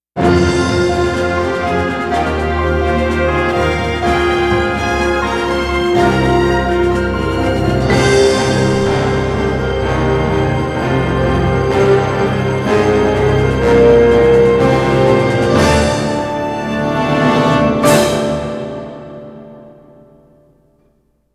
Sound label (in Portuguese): impacto